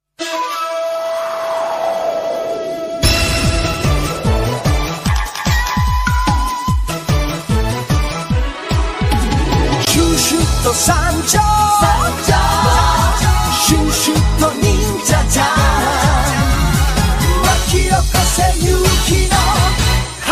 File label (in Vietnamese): nhac chuong remix tiktok